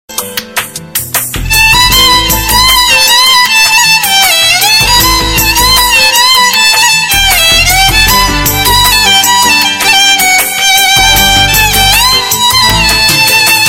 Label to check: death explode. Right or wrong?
Wrong